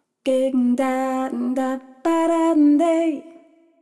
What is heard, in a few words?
Jazzy C; Jazz (Джаз)